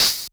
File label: Open Hat Analog Dirt; Lost Tapes From Mars